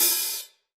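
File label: hi hat open, drum machine; EdgeSounds; Drum Mashines VOL-1; R-100 DRUMS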